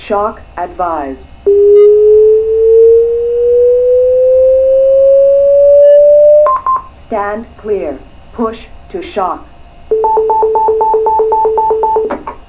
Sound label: shock